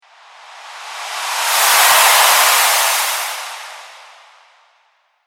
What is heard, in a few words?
Flutter Whoosh Strong